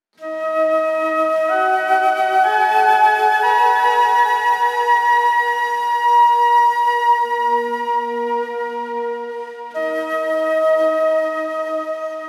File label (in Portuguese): Flauta